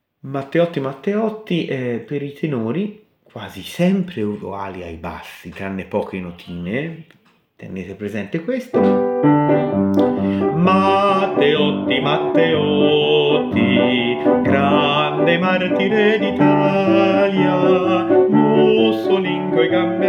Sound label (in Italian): tenori; Matteotti; Strofette antifasciste